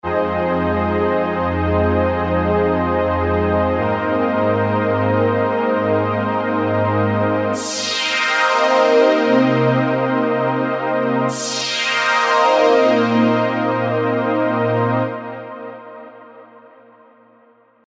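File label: Spire, pad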